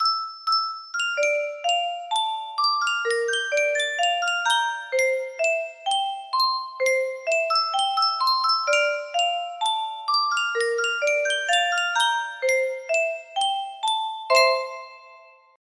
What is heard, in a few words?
Yunsheng music box, music box melody